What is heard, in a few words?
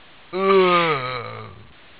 pain, zombie; rezombie; svencoop